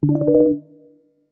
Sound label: word invalid; freepixel; WordJourney